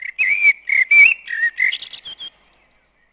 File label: BIRDY